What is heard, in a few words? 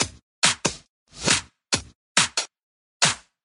message drum